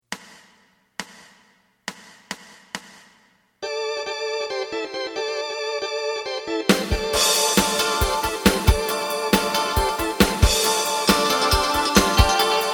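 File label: bass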